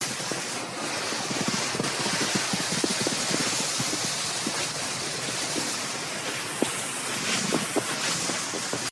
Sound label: sledding